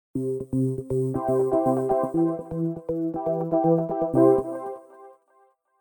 Something is begin

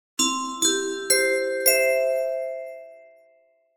Music box

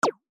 pyun beam

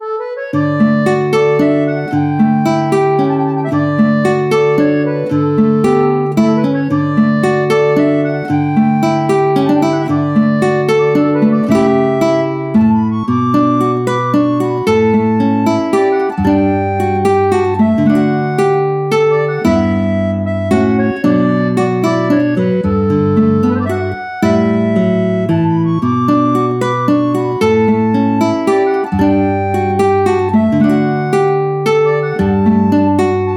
Relaxing song

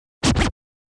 Scratch

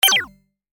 retro game

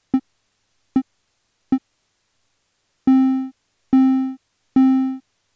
decay

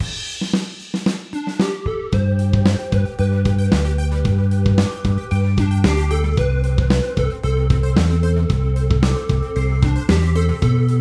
happy day Heartwarming healing band BGM

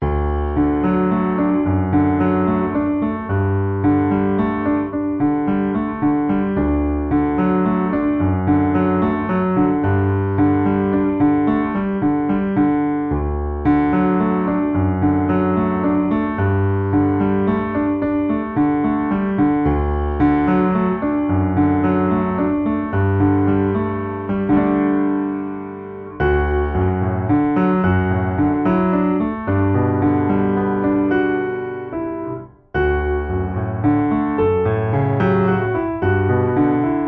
gentle morning piano

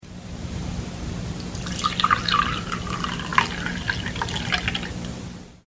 Pour tea

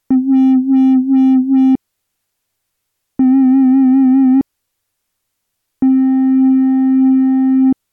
lfo

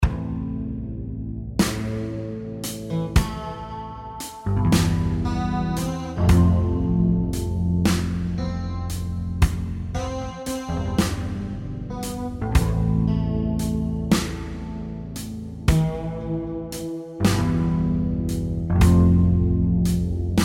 sentimentality slow band

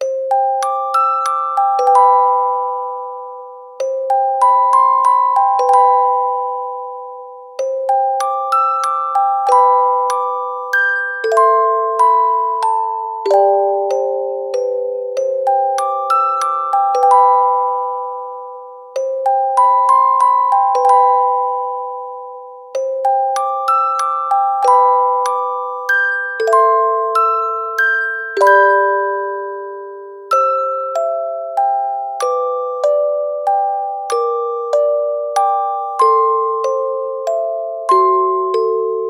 warm dream

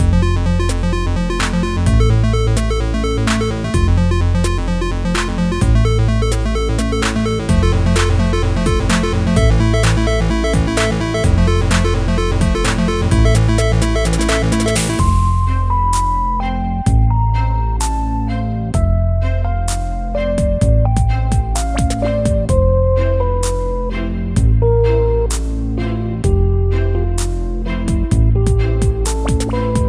Stylish and cool synthetic music

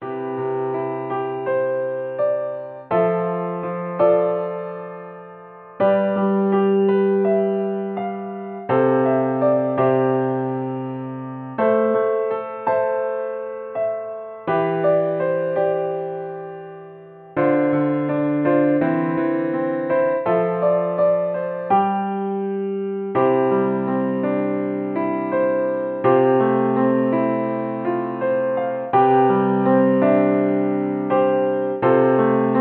ballad who feels peace

